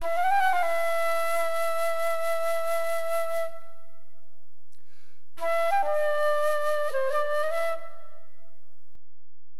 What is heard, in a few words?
FLUTE, Wind, AcidJazz Funk; LiveAct Pool 1